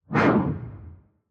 Fire Woosh; soundscrate

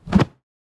block from hit

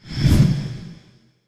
Ghostly Whoosh; SOUNDSCRATE

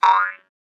boing

